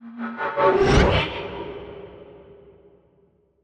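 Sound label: reverse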